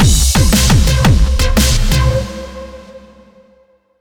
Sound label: DramaticEvent